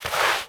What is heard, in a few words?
Crouch Move Foley; Warframe; Characters; Tenno